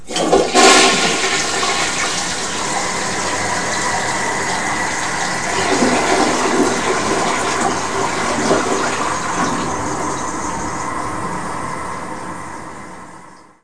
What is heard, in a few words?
TOILET; Desktop Toilet